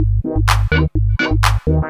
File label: lop 126bpm, House Instr and Stab Loops